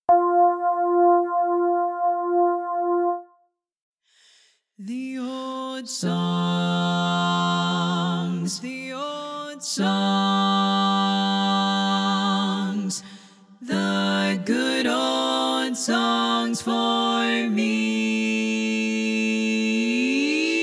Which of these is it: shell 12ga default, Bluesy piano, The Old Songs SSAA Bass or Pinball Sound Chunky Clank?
The Old Songs SSAA Bass